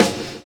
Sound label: END ROLL; Univers Sons